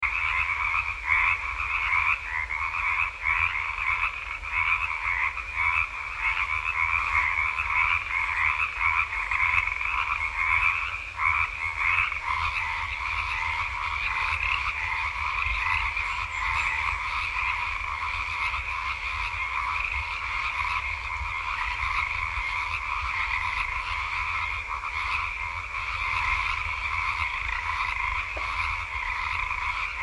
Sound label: atmo frogs dense and steady activity